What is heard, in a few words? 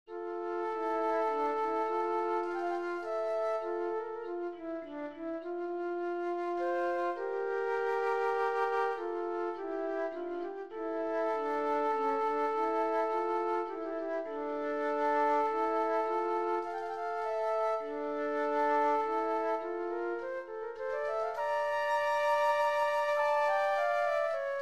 duo for flutes minor